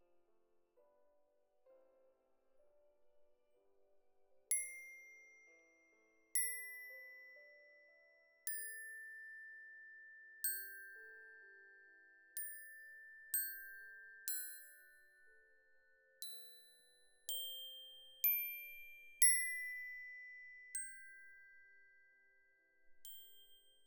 Glock Close

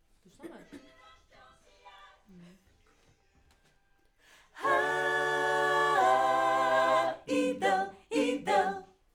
Backs AKG

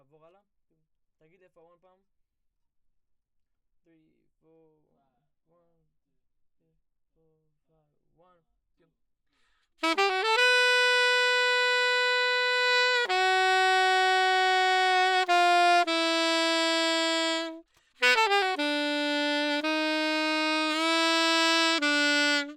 Sax; Gefell

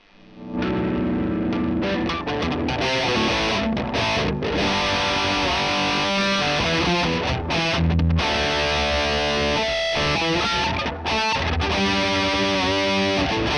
E Gtr

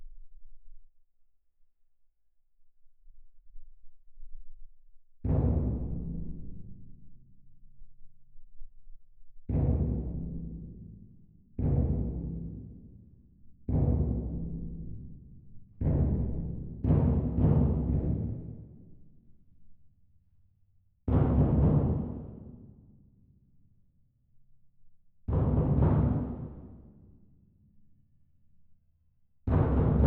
Twin R Perc

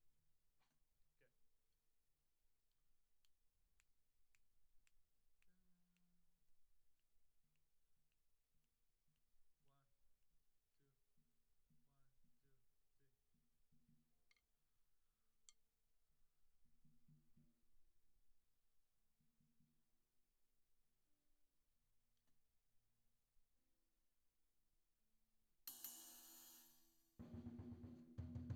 Tom

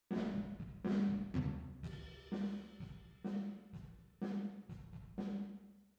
DRUM VERB

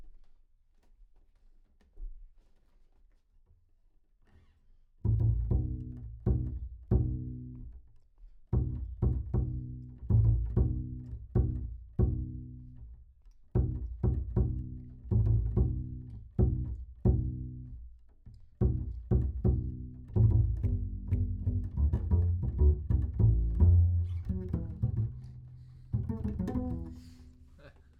Bass

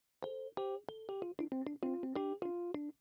Rhodes

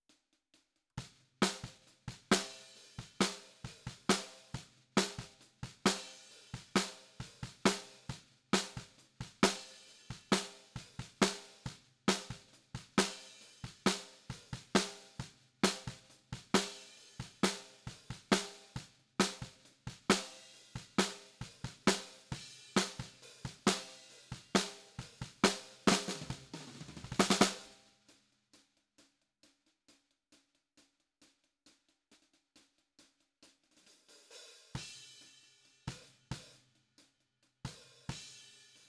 Snare Bot

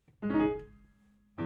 Piano Low

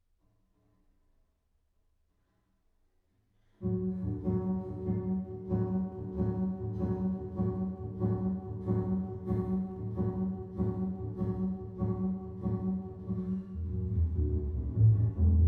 Bass

